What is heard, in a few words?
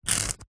office brick pen ball point score mark